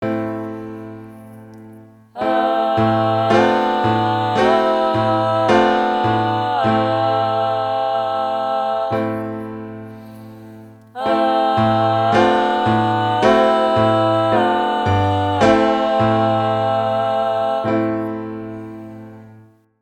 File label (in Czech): dvojhlasy piano a zpev